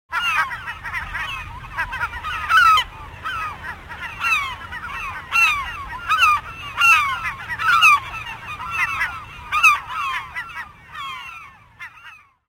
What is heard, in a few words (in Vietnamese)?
Tieng dan hai au keu on ao